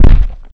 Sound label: Floor Hit